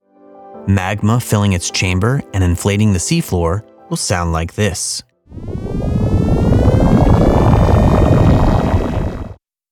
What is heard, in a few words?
Axial LT Update seafloor rise